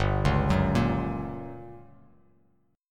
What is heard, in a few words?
G Minor Major 7th Flat 5th G, Bass Standard; arpeggiated; 3,4,4,3